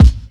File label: fresh fat bass drum single hit c key